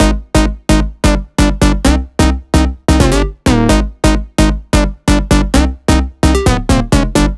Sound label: 130BPM Melody; VFH1 130BPM Danzon Kit